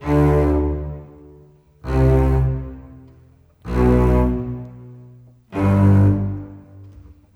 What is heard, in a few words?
Bass Cello, Cinematic; Sonic Foundry (Sony Creative Software); Orchestral Series VOL-3; Cinematic 14